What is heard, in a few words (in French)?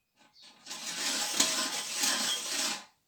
Bruitages metal crissements